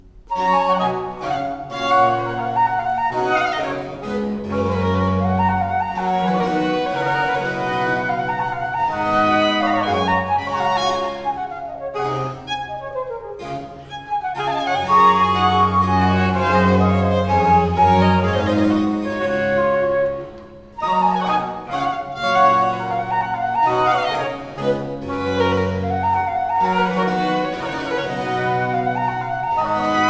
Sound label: Air Gai; Suite from Les Fetes D'Hebe; Rameau